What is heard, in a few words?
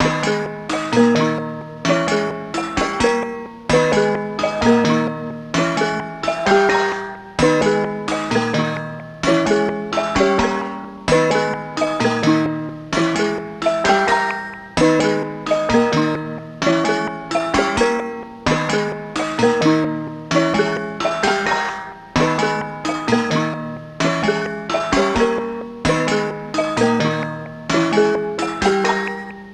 130 BPM G# Min Bells; Melody; Loop Stems; 2022 Melody Collection Platinum Expansion - Pt 1; Cymatics; Louis Vuitton